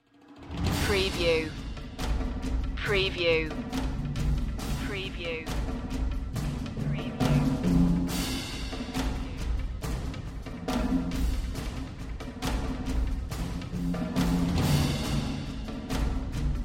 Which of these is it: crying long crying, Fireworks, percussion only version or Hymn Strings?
percussion only version